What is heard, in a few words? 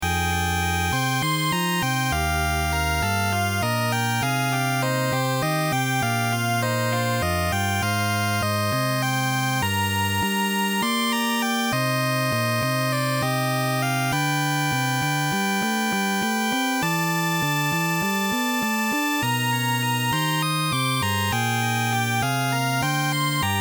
retro rpg op